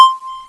Got Money; Asterax

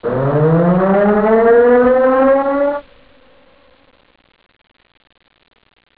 klaxon; da capo vol1; instruments; startrek

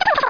blob

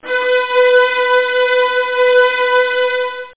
kw strings; Zoom - Release 2 (1996); Active Software

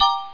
pickup appears; Space Captain

